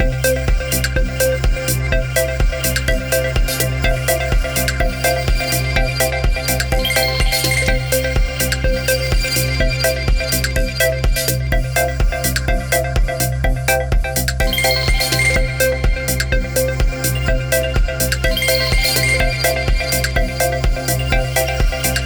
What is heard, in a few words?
Atmosphere, ringtones; LG V60 ThinQ